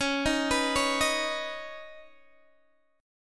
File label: C sharp 7th Suspended 2nd, Guitar DADGAD